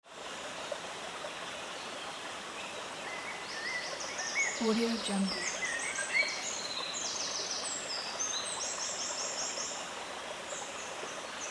hot summer day in a suburban forest with nearby stream; mountainaud